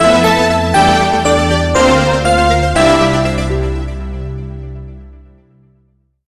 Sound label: Game Over; Jett Rocket II The Wrath of Taikai